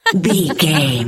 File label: voice female laugh